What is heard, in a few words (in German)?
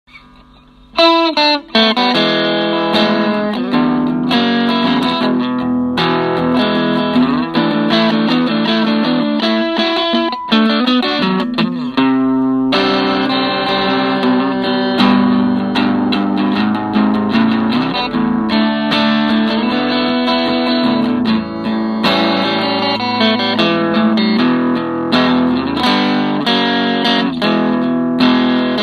Gitarre